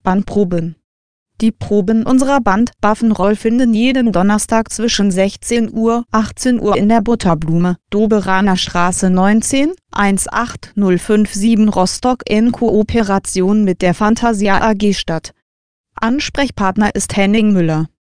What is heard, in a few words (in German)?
bandprobe